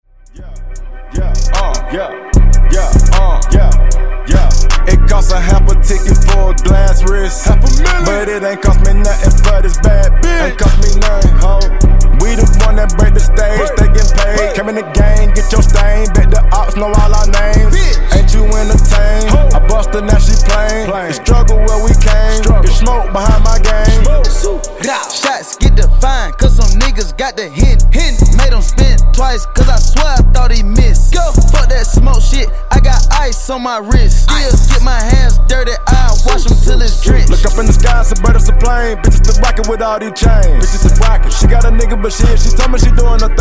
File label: Ext Redrum 76Bpm